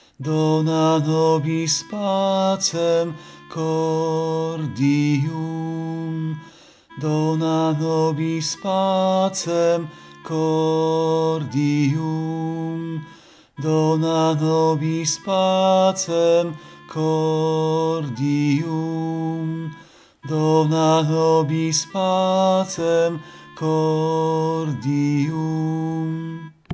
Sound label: sopran; Dona nobis pacem cordium; Taize